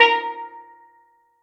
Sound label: Steel Drum Bb5